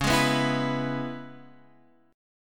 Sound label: C sharp Minor 7th C sharp Guitar Half Step Down; C#m7